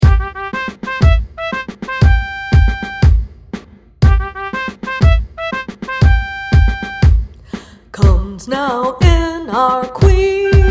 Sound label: fanfare lead